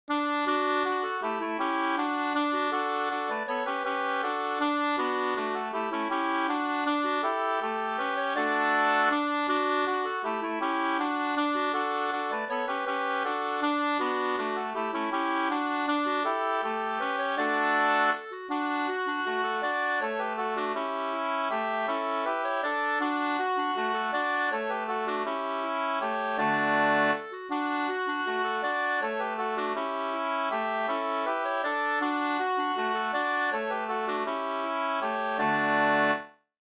vals; Flickornas dans pa kyrkogarden Sm

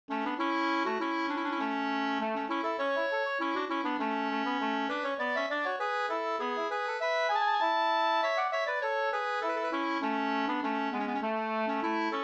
ganglat A-dursganglat; Elg Anders; Rattvik

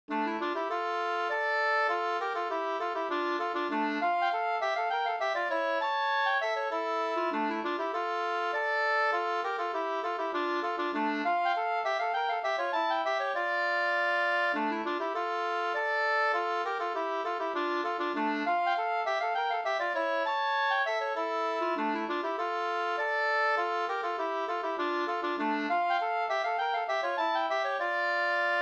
polska Bingsjopolska; e Pekkos Olle nr1 2st

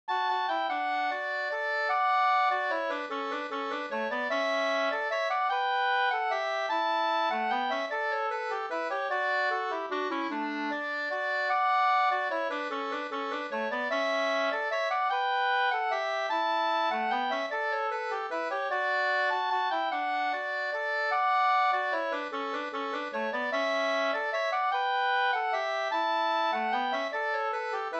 vals; Ante Falk

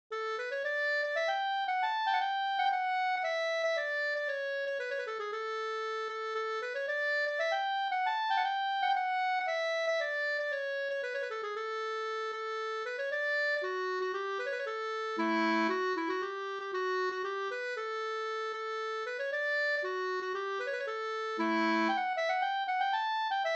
polska Bodapolska

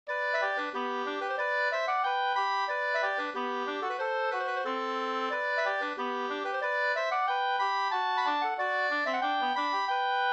engelska; Skepplanda

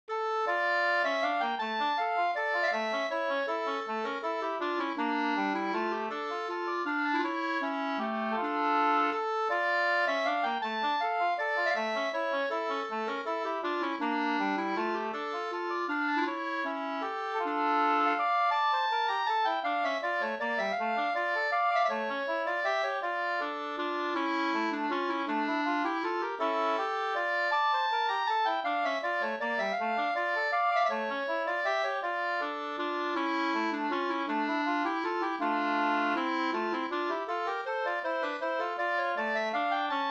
vals i gammal stil

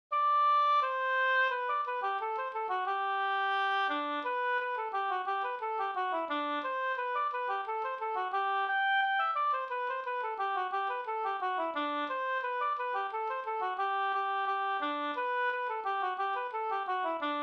engelska; Hogen

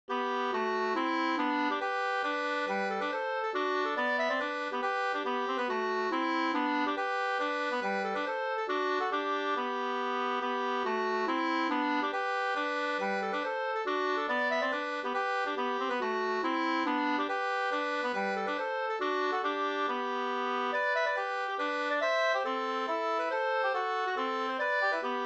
hambopolska

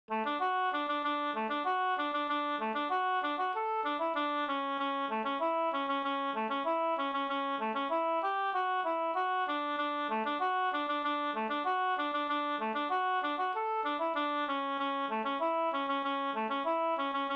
snoa Jamtsnoa D G dur

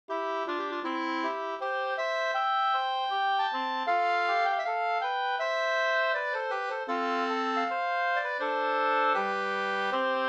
brudmarsch; Osterakers brudmarsch

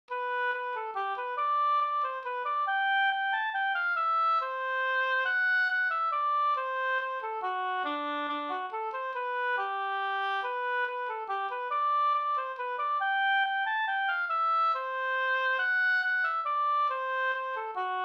hambopolska

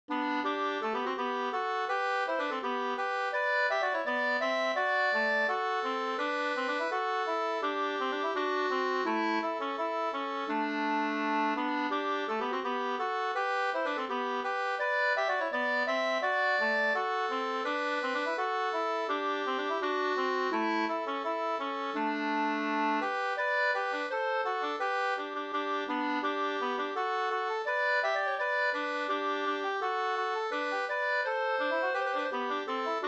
ganglat; Bevaringsmarsch

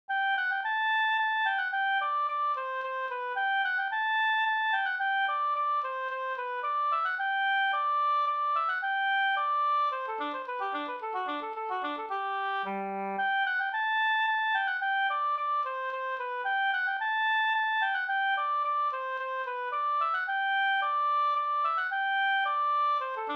slangpolska G dur

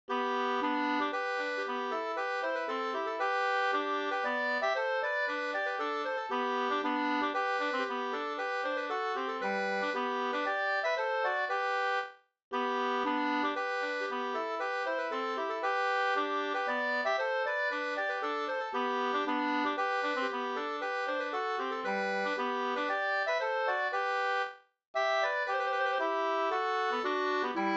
polska, Gdur, 2st

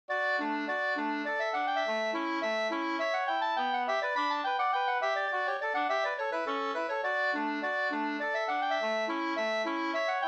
slangpolska 2st

